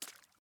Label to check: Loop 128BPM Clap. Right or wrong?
Wrong